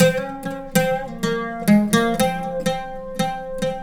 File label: SITAR ETHNO 125; eJay; Guitar